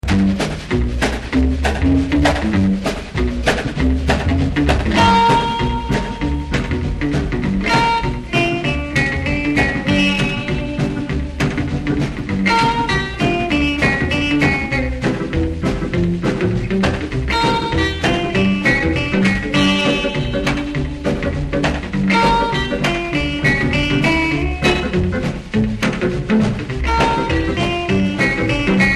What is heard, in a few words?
Crying Guitar